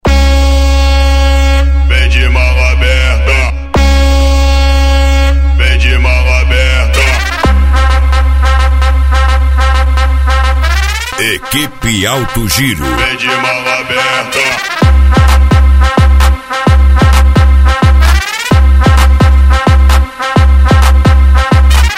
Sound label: Funk Sertanejo; Sao Borja RS